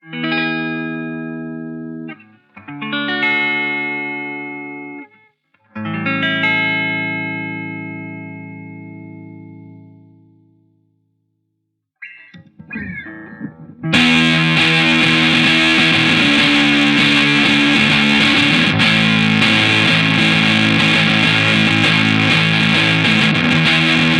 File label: neck rithm, SOLDANO